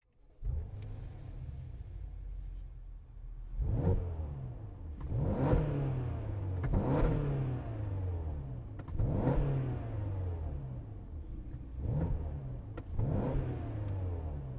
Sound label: BMW X5 M